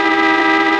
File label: HORN; LFS